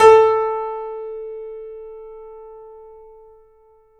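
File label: piano, A, left; InVision Interactive - The Piano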